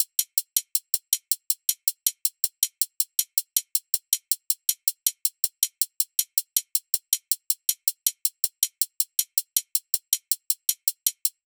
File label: loop hat 160; Seismic Loops